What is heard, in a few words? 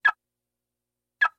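car alarm sounds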